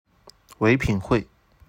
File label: vip